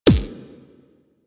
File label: Rail Jack Shield Hit Notification; Enemy Ship